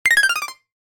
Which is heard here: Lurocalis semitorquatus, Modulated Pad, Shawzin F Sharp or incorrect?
incorrect